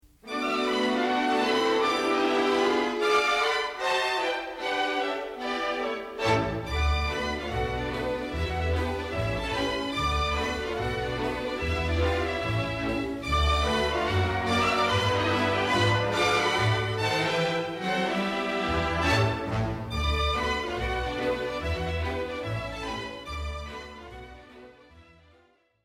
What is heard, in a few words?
jazz legato; Played with the bow in legato style